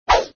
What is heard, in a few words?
KICK; mecha cat destructo